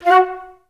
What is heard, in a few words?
FL GUP I#F3; Best Service; Peter Siedlaczek's Advanced Orchestra